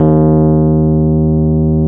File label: E PNO D#1; Synclavier Sampler Library